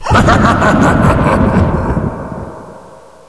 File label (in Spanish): risa